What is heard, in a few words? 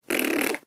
raspberry; ʙ̼̊ːː